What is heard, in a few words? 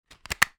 game disc place in case; technology nintendo wii; zapsplat